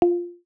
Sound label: beacon; iOS 26 Sounds